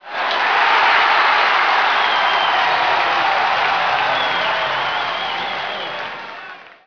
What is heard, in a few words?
clap, stadium; hockey95